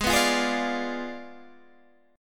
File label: G sharp Diminished 7th G sharp, Guitar Standard; G#dim7 strummed; JGuitar